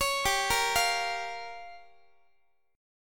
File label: G Minor 7th Flat 5th, Guitar; Gm7b5; arpeggiated; x,x,16,17,14,16